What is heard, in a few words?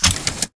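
Tick Movement Footstep Jump Land; Titanfall 2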